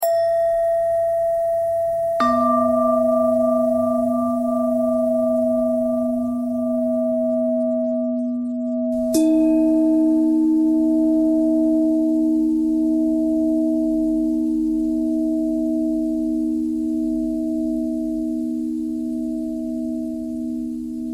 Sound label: bell bell thread noise